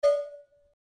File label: woodfish